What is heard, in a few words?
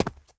step run rock; FootStep